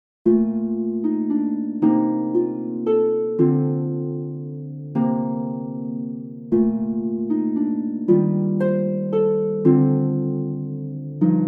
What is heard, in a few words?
harp; easy jazz and blues for harp